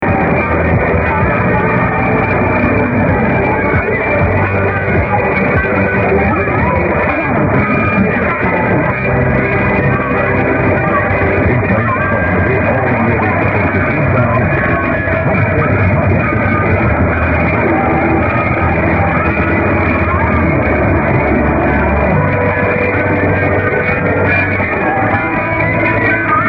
unid w stn poss

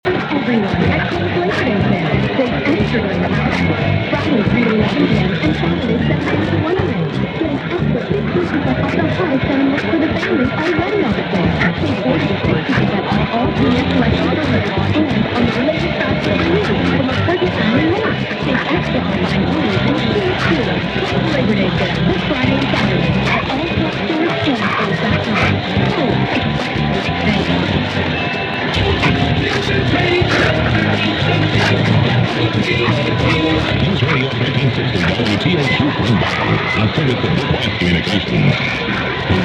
1360 poor, mw dxing; wtaq

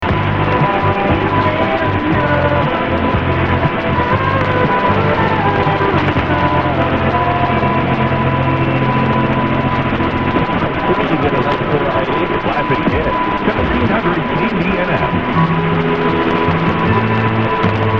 poor on sony hd rx; 1700

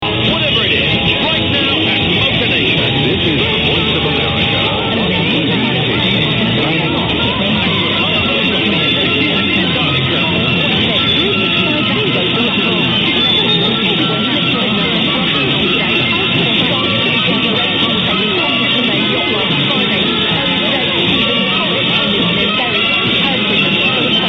ssb then am; 1170; voa; magic

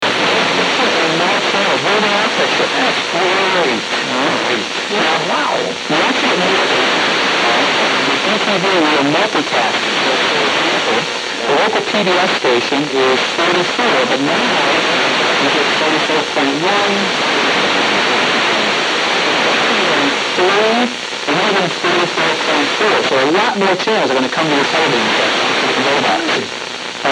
us tv audio loop tape; transatlantic

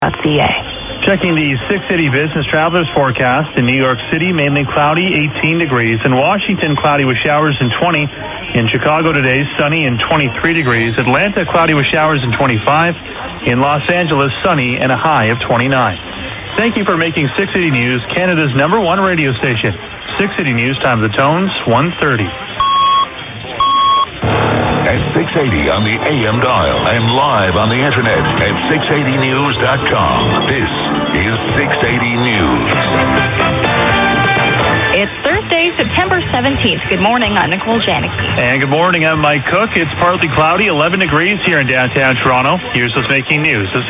vg signal; cftr

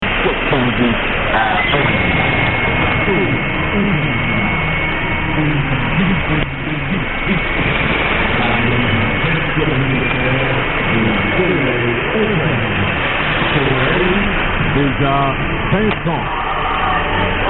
radio AM SSB; 1710; radio soleil

